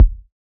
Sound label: Kick; Samplepack Produtique